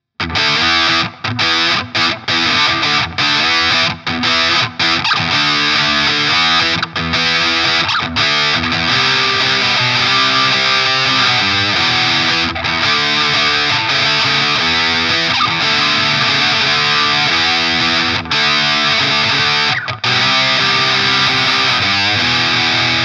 Electro Harmonix Nano Metal Muff Distortion EQ flat Dist 9h Gate 10h